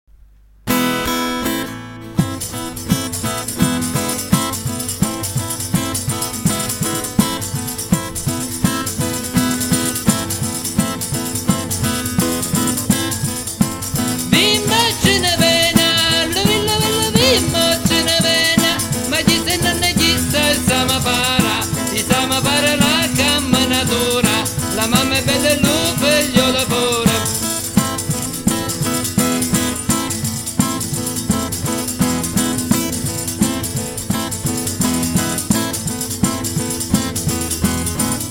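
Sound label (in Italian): Tarantella con stramulettë Rignano, dialetto rignanese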